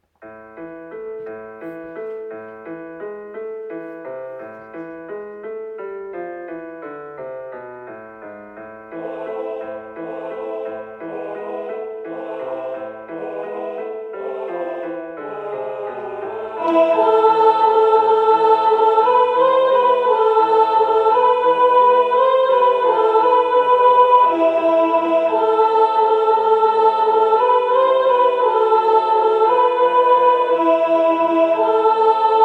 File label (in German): sopran; marmotte